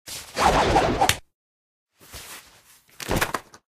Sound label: didgeridoo sit down; video games; player; taunt; source engine; tf